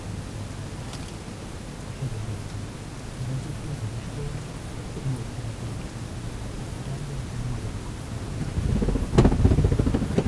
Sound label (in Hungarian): barlang néhányuk, denevérek repülés; termeszetben